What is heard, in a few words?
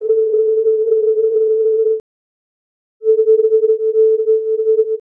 Noise Modulated